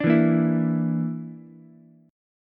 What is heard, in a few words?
D Suspended 2nd Sharp 5th D Ukulele; strummed; 7,4,6,7